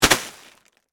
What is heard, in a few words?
Impact Object Fall, On, Plastic, Tarp; Miscellaneous Impact; BlastWave